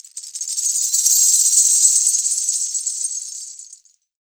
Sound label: MIXED PERC; 90 s Sample CDs